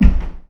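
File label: ladder wood, player; quakemapping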